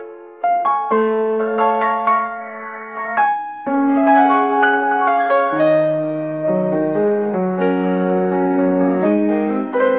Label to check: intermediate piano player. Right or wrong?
Right